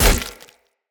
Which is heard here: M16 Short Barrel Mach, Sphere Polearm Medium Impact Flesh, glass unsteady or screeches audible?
Sphere Polearm Medium Impact Flesh